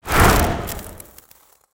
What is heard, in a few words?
Ice Shuriken Throw; Spinjitsu